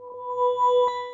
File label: Wine glasses, FX Effect Sounds